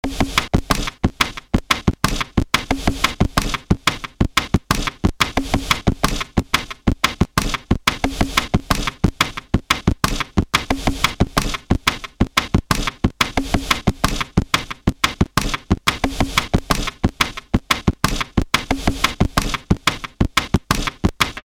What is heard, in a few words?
drums master more control